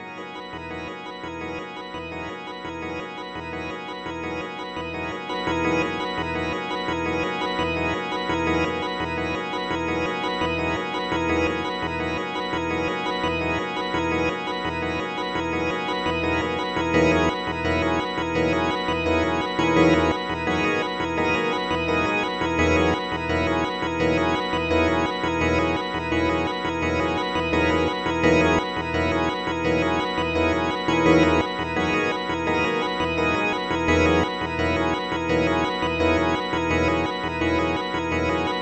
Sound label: Loop G# 170 bpm; 99Sounds; Mangling Audio; Mangled Software; Midiception